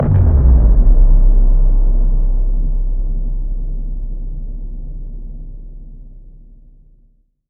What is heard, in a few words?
Bassdrums FX, FX Kicks; Vengeance Samples